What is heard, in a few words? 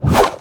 Staff Underlay Attack